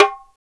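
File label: perc darabuka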